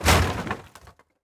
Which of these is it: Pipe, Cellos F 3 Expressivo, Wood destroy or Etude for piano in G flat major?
Wood destroy